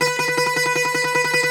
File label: Greek Bazouki, Plucked Strings